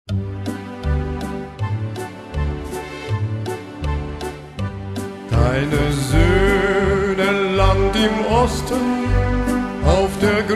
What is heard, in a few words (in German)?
Ostpreussisches Reiterlied Gesang; Lieder